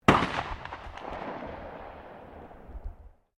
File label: RIFLE UNKNOWN FIRING DISTANT; Rifles, non military; Guns